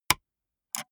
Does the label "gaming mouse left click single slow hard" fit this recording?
Yes